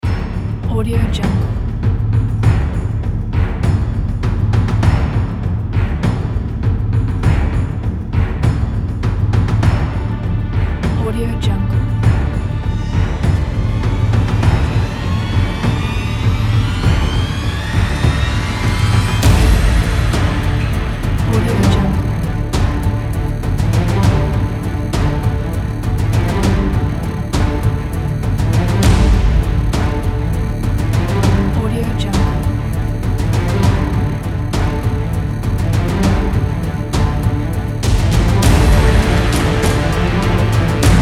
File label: epic sea battles